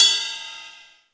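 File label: cymbal Rock; hydrogen-0.9.3; GMkit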